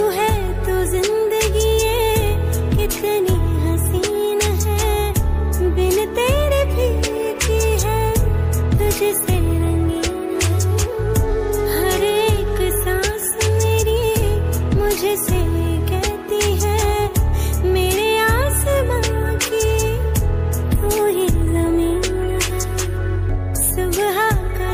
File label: romantic ringtone, bollywood ringtones